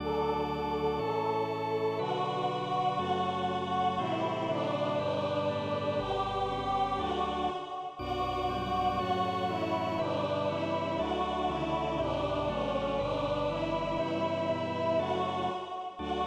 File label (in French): Choral, Re M, Choeur et orgue; Allemand-Francais; Bach; Choral final Passion saint Jean